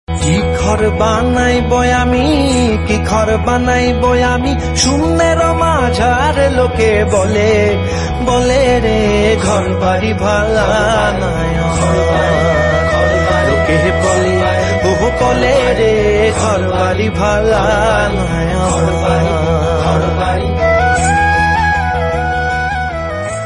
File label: Bengali Ringtone